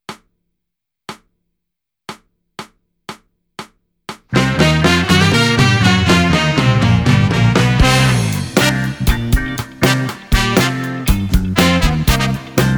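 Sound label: Demo with click, Concert Backing Tracks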